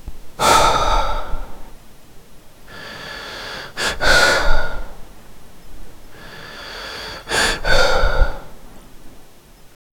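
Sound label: realistic very slow, breathing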